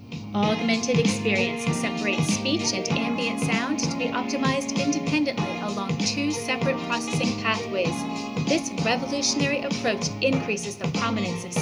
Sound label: music; Signia AX